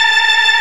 Organ, MortStrg+WurlVox

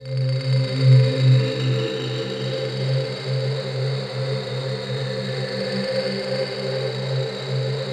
TUMBLING; ENVIRN-AMB

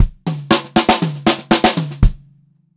120 pop fill, Pop-Rock Drumloops; USB Soundscan vol.37; AKAI

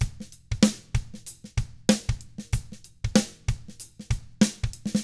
95 funk, drums; ILIO; Double Platinum Drums 1

